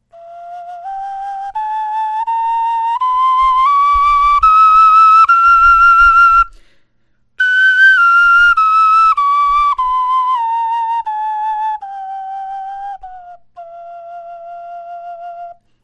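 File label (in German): tonleiter, Knochenflöten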